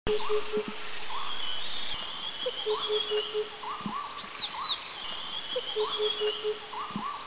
Himalayan Cuckoo, Dirang, India

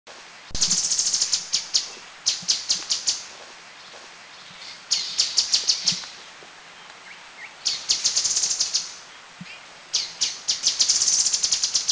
Grey-headed Canary Flycatcher, Thailand

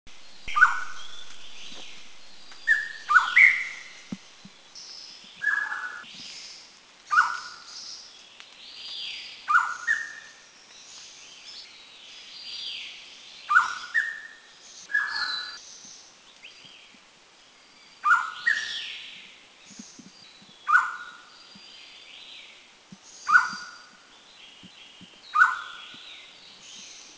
Black-necklaced Scimitar Babbler; Pomatorhinus erythrocnemis Country endemic; Bedongyueshan; Taiwan; 2 birds at Bedongyueshan performed exceptionally well

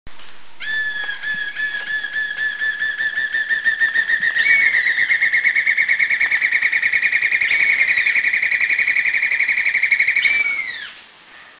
Black-and-Yellow Broadbill; Thailand; Krung Ching

